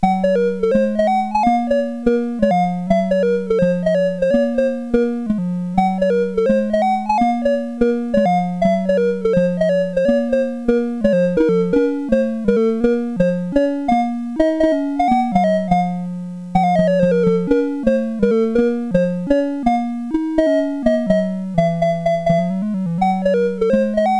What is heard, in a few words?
sid CSG8580R5; Nutcraka